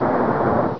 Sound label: STRIKE